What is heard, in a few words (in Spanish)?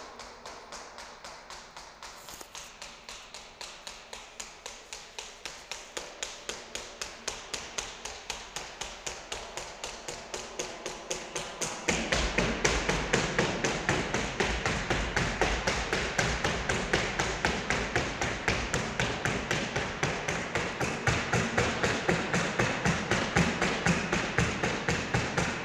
metal